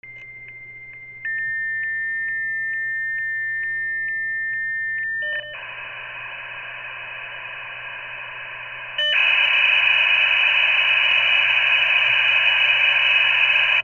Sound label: PHONES